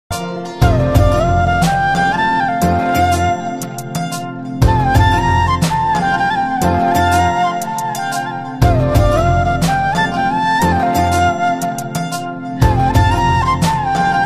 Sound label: instrumental ringtone, classy ringtone